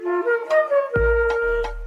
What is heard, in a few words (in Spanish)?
Flauta